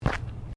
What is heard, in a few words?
FOOTSTEPS SNEAKERS PLASTIC GROUND MAT STEP; Footstep Foley; Footsteps (Sound Effects:Human)